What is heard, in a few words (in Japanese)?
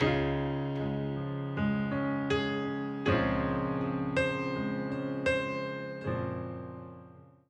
ピアノ